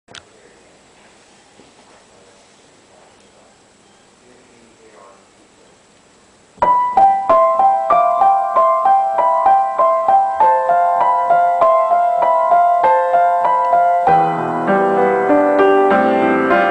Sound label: piano; cardcaptor sakura